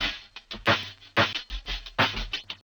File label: BACKBT, HipHop; LiveAct Pool 1